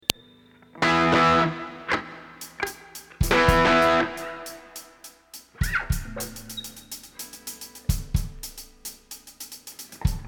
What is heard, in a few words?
Ambient Guitar Mix